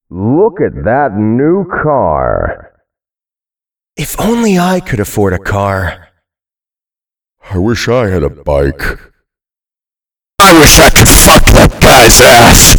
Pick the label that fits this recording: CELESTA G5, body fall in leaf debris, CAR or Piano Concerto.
CAR